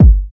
natural kick one shot g sharp key, kick drum sample tuned to the G# note